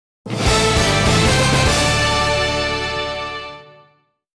Congratulations!; King of Fighters Maximum Impact 2

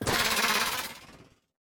Leap

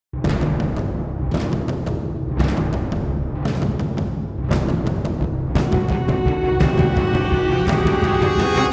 String; Assassin's Creed Unity Dead Kings

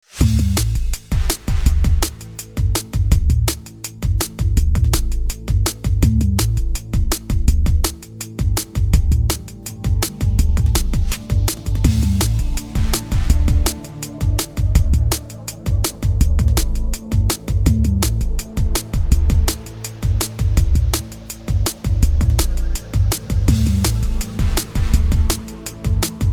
Battle

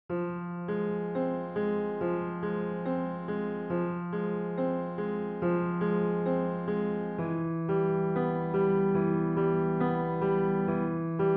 The Cave Theme (Piano)

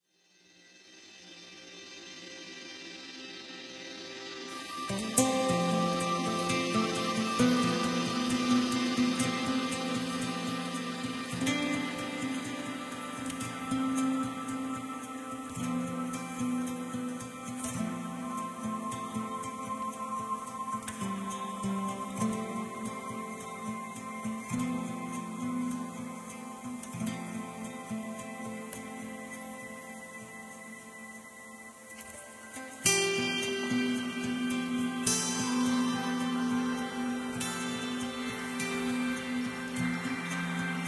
Harbour Sting; ether one redux gamerip 2015

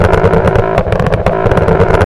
RUMBLE

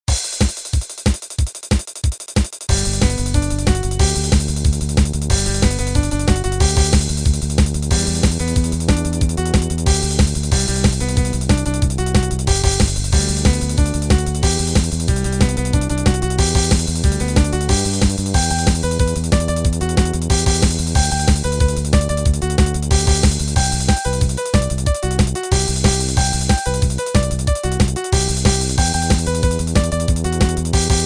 BRICKS BACKING TRACK HARDEST; horace ps4 switch windows xbox one gamerip 2019

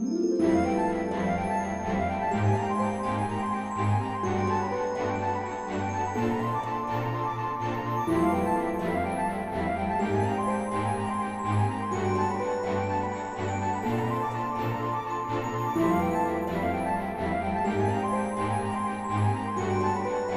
Summon Buildup; Fantasy Life Online

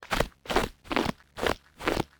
snow walk

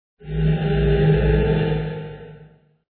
heal sound; Necronomicon: Book of Dead Names Online

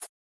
Catch Blade; Boomerang Fu

